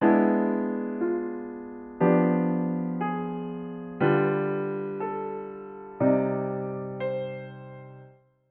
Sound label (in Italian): Ritardi dissonanti